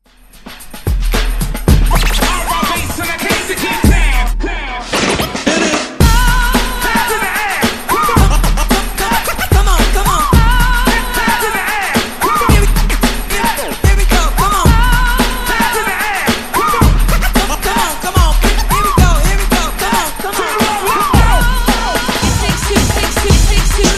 Funk Mashup Blend Clean 111 Bpm

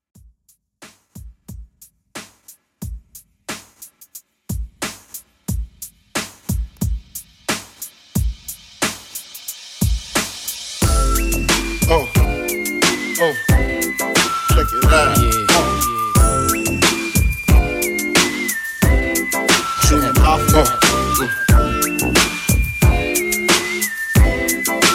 Old Hip Hop Redrum 90 Bpm

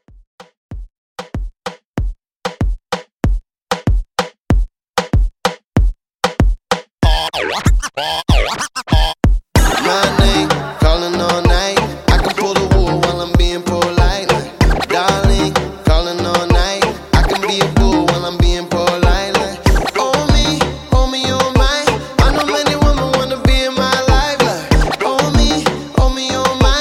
Hip Hop, 95 Bpm; ReDrum

